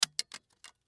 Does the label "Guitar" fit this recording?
No